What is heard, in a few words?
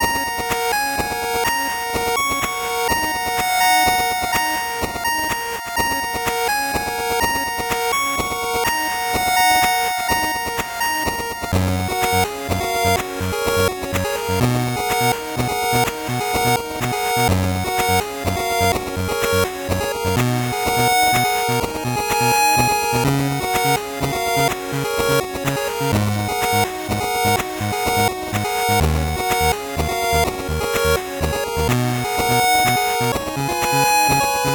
8bit bgm fast